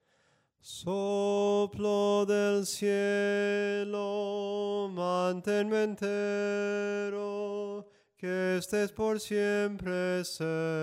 SOPRANO CANTADO, himnos

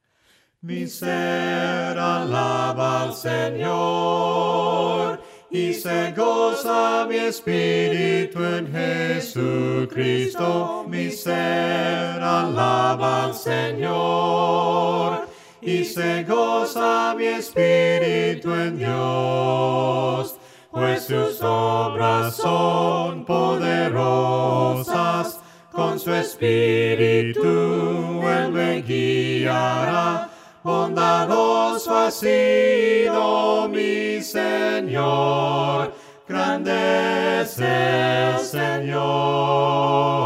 MI SER ALABA AL SENOR VOCES

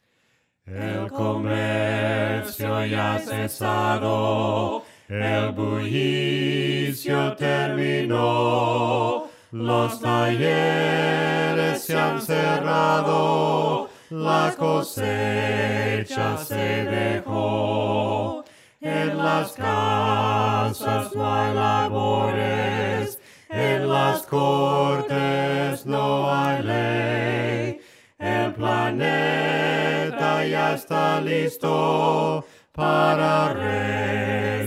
VOCES VOCES, himnos a capella; cantos del camino; EL REY YA VIENE

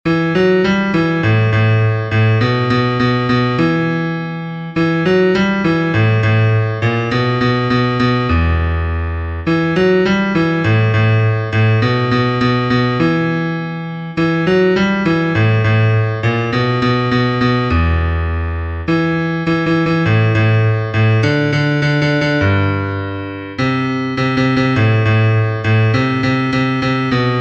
BAJO, himnos en piano; 36 ALABANZA; cantos del camino

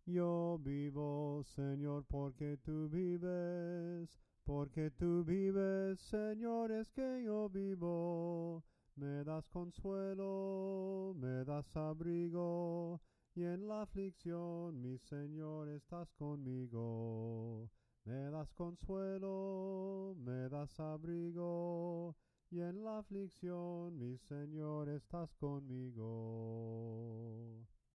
SOPRANO CANTADO, himnos en melodia soprano

